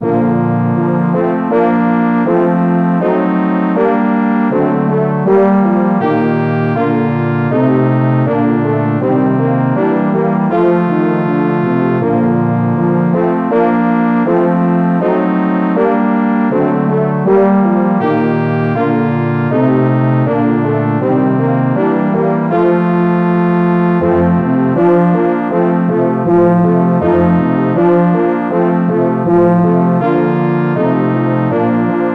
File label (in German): hornquartett in b